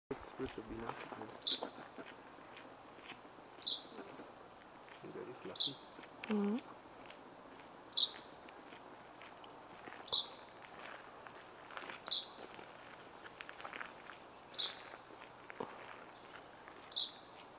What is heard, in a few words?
Fringilla coelebs female; سهره خمسه ماده برای جلب جفت